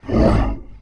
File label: Hit